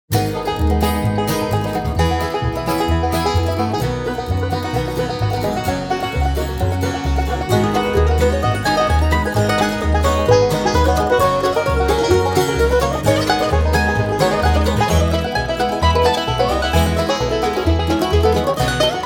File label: country